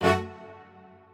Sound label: strings